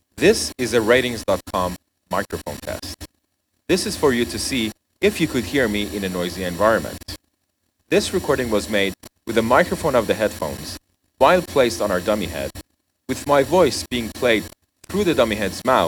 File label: noise tournament